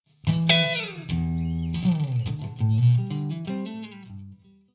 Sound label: ge 6l6gc nos clean